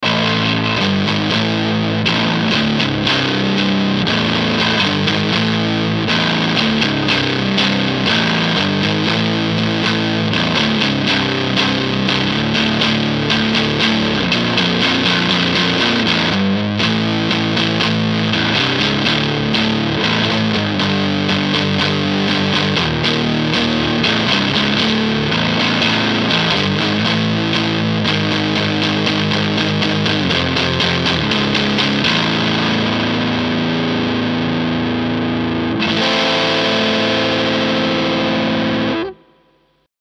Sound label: Ibanez center bridge